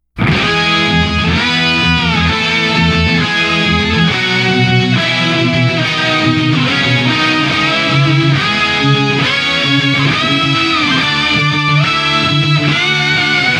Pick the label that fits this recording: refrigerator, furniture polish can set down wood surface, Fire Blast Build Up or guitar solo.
guitar solo